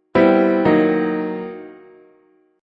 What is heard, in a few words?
Jazz piano riff loop in D# minor at 180 bpm.; Jazz Piano Loop Riff 2; Keyboard Loops